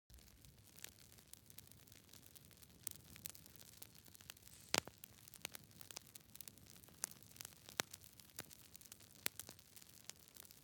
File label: campfire